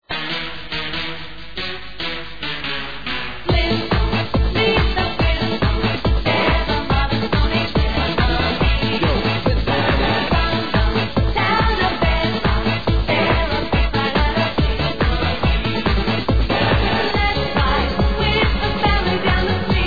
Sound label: technojazz